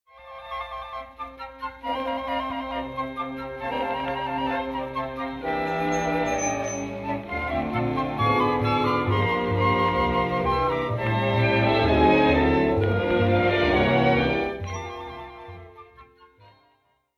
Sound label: Flutes Flutes Flutes